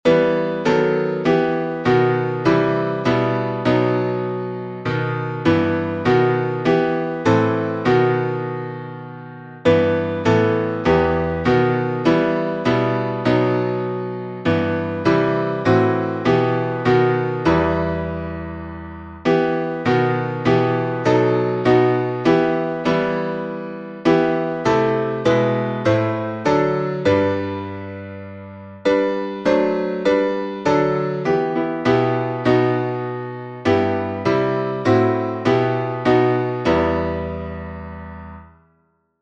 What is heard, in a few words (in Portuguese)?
salmo instrumental